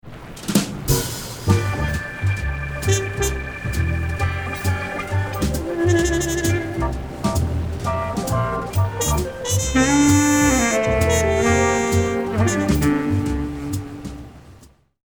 Jazz Dialog Denoise

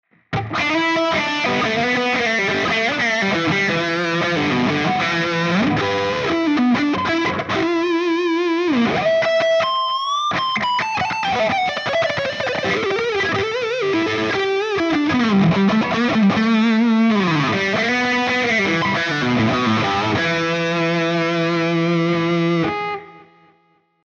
Epiphone Les Paul Both PUs Highgain Lead

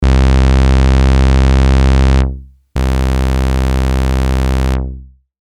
VCZ vs CZ

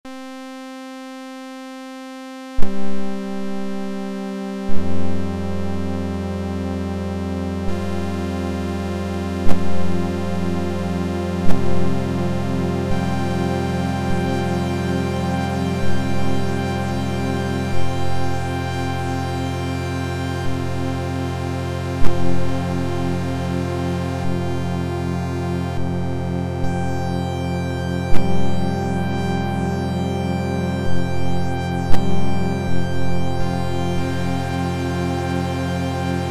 Krischer M8 Csus4

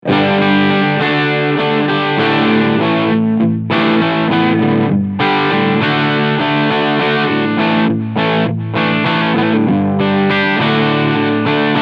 Overdrive Preamp Switch Down Half Gain; JHS Pedals

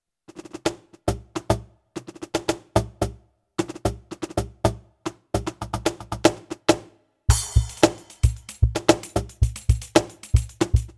PEARL eMERGE

